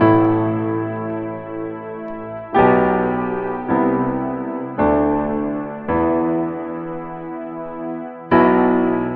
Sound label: piano, Loops, Sad